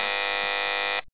BUZZ; AMG - Now CD-ROM (Roland); Art of Samplng

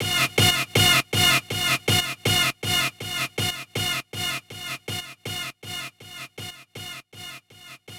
Stab Loop 120; Equipped Music

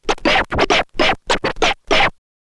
scratch kit, vinyl; scratches; Masterbits; Vinyl Attack

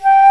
FLUTE G4

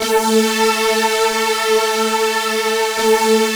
saw lead synth

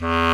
Clarinet & Bass Clarinet

